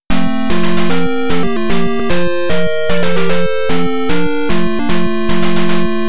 Nintendo Entertainment System Famicom Disk System NES Startup; Video Game Consoles Startup